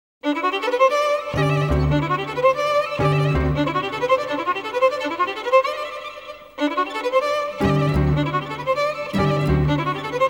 Symphony Orchestra, Choir

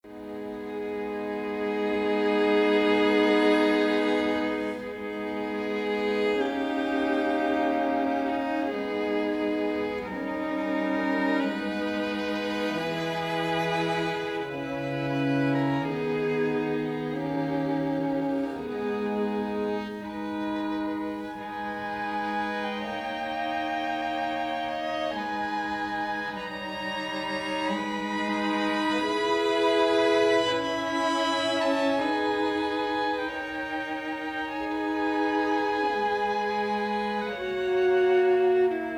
String Quartet in C-Sharp Minor, Adagio; Beethoven; No. 14; Op. 131 VI.

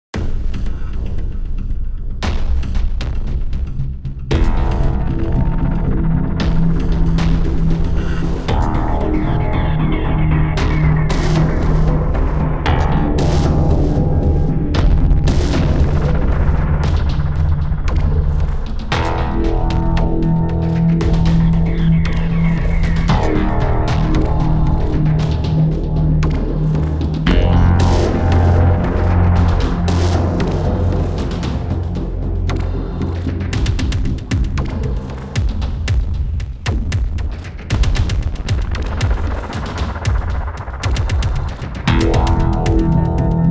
Wooden Suspense

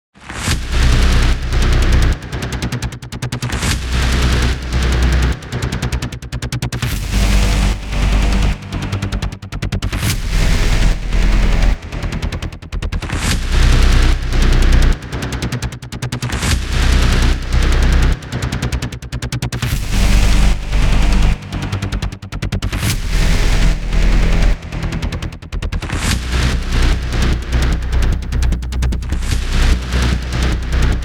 BASS

